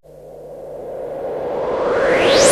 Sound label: Sweeps Medium